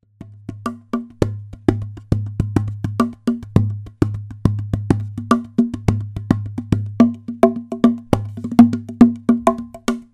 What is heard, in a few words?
djembe cajon